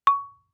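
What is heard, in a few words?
Marimba yarn fortissimo Db4; MIS Pitches - 2014; Percussion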